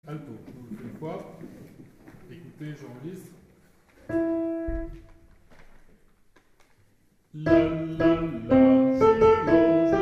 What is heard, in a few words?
La la la Alti